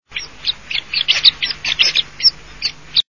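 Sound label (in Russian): vjurok snezhnyjj, snow finch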